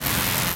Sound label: laser burn